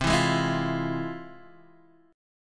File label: F Minor Major 7th C, guitar chord